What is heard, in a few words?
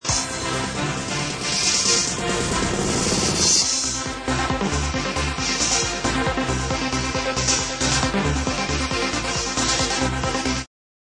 This is Techno; Motorola E398B